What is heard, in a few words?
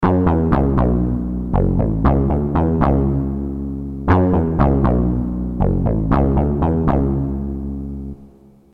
moog, roland mt 32